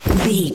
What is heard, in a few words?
foley jump movement throw